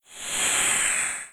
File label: soul raven; kult